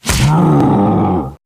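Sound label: free spins grunt hit heavy; wild worlds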